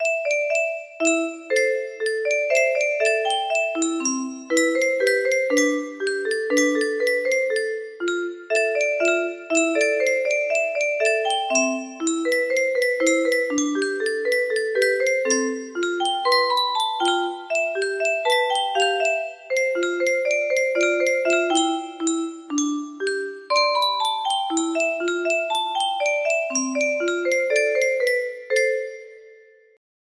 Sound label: music box